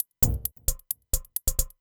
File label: HiHat Perc Loop, Dance Beats